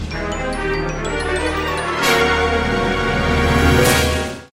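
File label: Suspense sms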